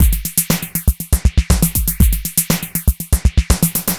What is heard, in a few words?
Noise Beat; Beats; Drums & Drones